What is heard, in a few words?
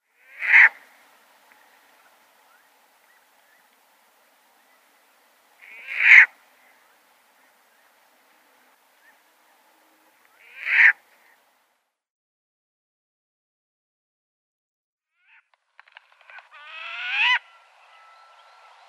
Short-eared Owl calls